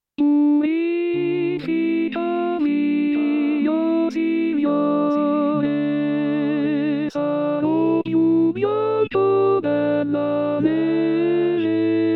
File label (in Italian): contralti